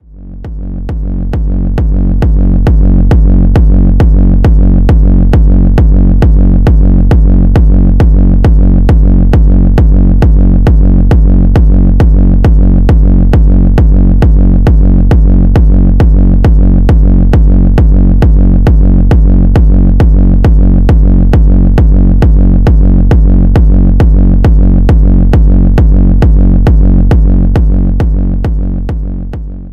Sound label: signature kicks; zumstein